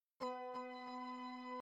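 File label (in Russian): чистая уборка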